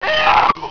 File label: SCREAM